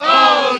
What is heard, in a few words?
"oh", crowd